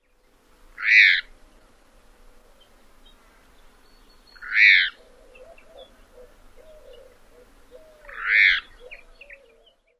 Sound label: calls; AZ